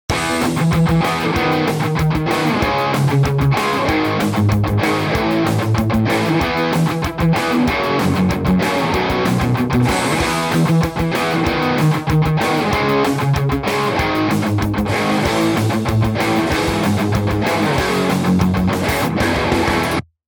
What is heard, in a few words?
GTR